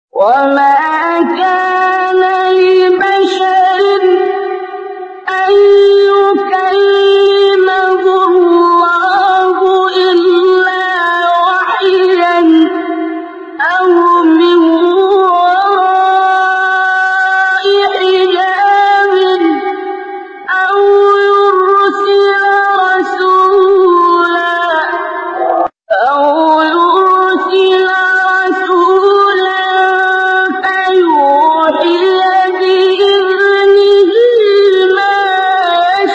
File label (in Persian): فراز ملکوتی تلاوت آیه سوره; شوری; استاد عبدالباسط